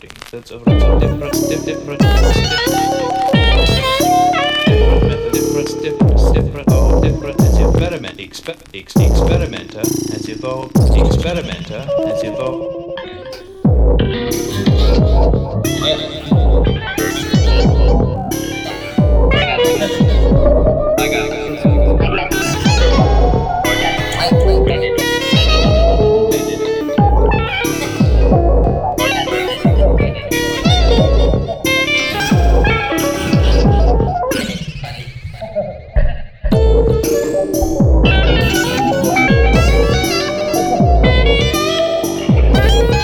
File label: Alto In A Minor